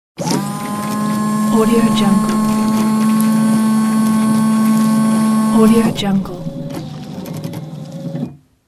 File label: windscreen washer